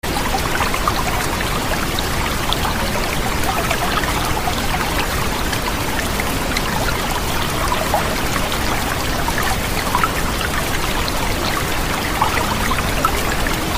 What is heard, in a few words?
936hz; pineal gland activation; sleep